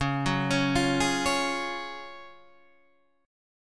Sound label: Gb Major 7th Suspended 2nd